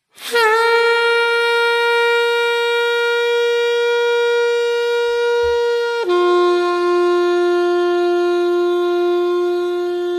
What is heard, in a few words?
Fuzz